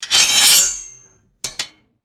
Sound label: sharp metallic sword blade scrape; weapons